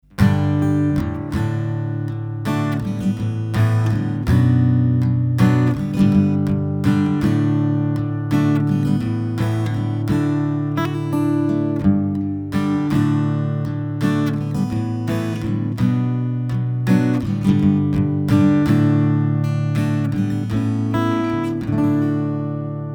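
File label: ZOOM AC 3 round shoulder Gibson aj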